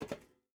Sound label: footstep rubber boots metal walk; Rust